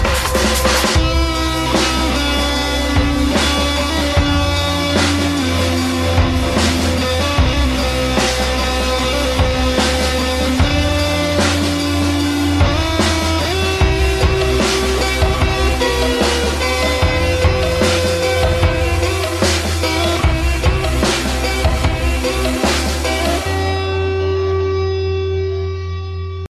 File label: space guitar